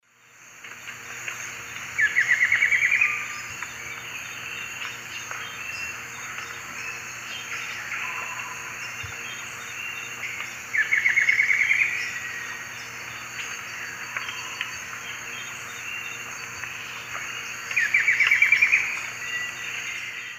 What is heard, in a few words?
Hemitriccus iohannis, song; S. America; Eastern Lowlands